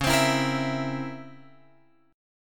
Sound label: C sharp Minor Major 13th, guitar chord